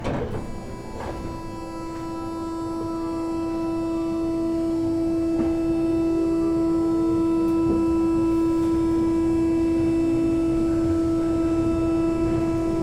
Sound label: Tokyo Metro 6000